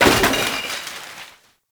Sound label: Garbage Bag Destroy; Warframe; Ambience; NineteenNinetyNine